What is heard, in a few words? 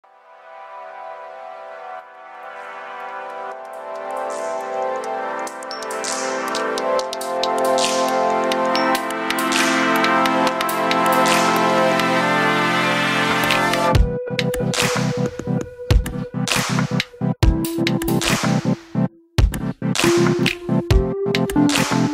movie background ringtone